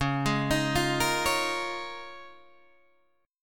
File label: C sharp 6th Add 9th C sharp down Guitar Open D